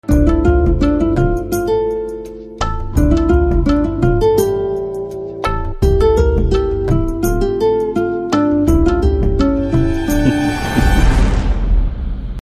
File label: BGM Telugu Ringtone; Lakshmis NTR